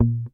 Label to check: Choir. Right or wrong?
Wrong